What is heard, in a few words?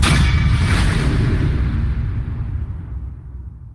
mission passed; gta v